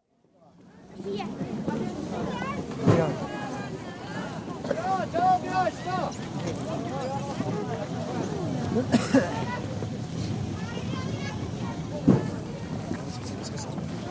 Changchun: Busy market atmosphere, Changchun, with light traffic; Stores And Mall; China; BBC